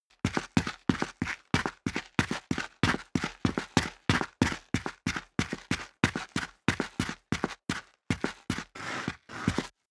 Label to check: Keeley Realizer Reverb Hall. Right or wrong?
Wrong